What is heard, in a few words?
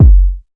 KICK